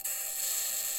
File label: mic, mars2020 edlcam